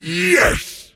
last hit pain; pudge

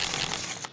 reload; dota; hoodwink

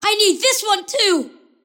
deny pain; kidvoker; dota; invoker

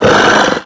death worg; dota; creep deaths

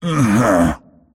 move pain; pudge

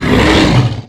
death centaur khan; dota; creep deaths

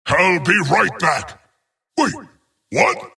death long; dota; skeleton king

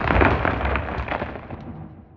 ice, ambient soundscapes; dota

